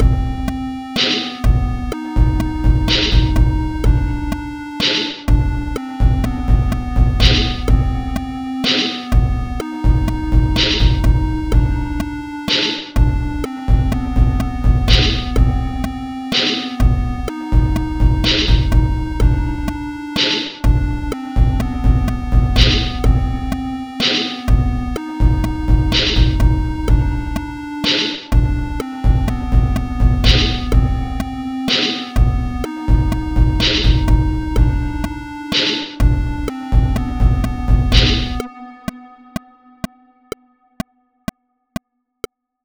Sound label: Sine wave